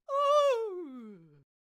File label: Candlehead Death Vocals